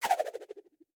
Throw Aimpowered; Boomerang Fu

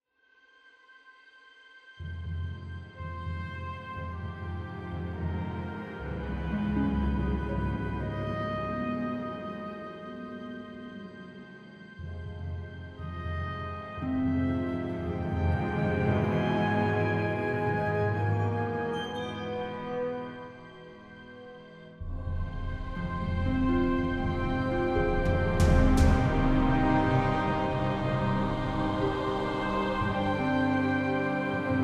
Tactical Ambience; Master of Orion

